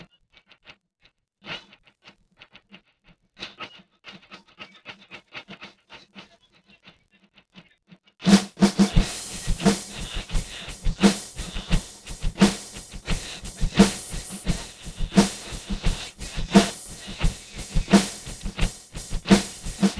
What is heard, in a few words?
children percussive